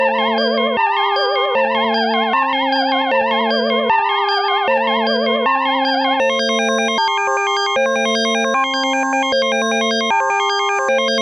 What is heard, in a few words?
Arpeggiasm